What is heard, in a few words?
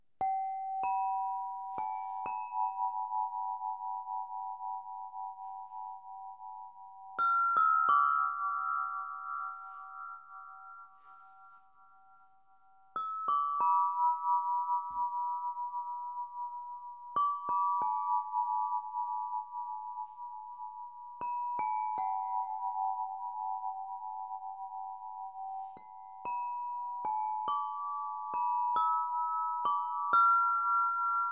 Throat vibrato, harfa krysztalowa; Lekka harfa krysztalowa; Czakra gardla G